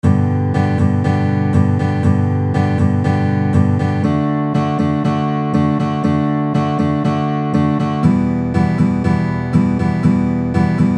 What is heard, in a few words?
G G D D Em Em C C at 120 bpm